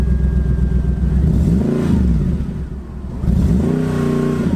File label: mercedes mclaren; carface